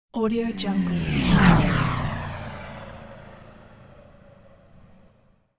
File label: micro plane fast flyby; urban sounds